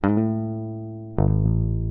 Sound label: HOUSE BASS 125 A